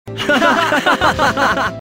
laugh

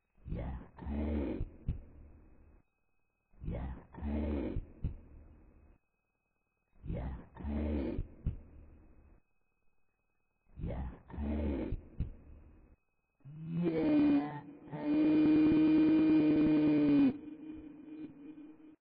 wake up

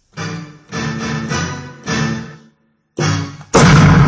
jingle

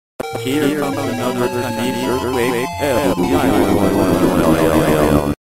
chinese earthquake; cave

